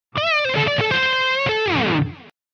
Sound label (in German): E-Gitarre, ringtones